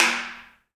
HAIRCUTS, KENYA DRM, Heart of Africa

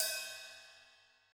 Ride, 135bpm; Zero-G Sound Sense Grime Scene; Construction Kits; A Positive Vibe

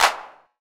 CLAP HOU, Dance Mega Drums; Best Service

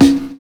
UDU BEAT; Univers Sons - Kitch 70s

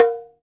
Bongo Low; Sonic Foundry (Sony Creative Software); Joe Vitale Latin Percussion

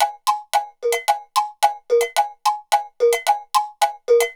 Bell Gallop, Percussion Loops

